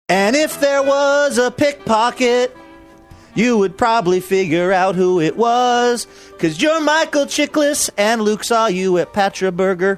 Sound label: singing and if there was a pickpocket